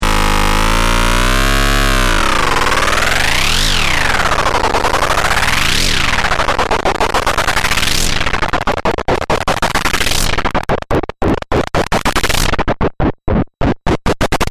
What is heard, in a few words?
Synthetic Static Distorted Buzz, Oscillating buzz noise; pitching, good for sound design